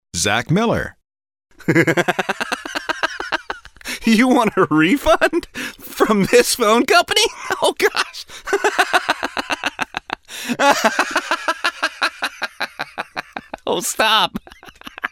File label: LAUGHING